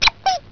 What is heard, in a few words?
squeeze